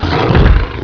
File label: fall; opentdm; CyberDemon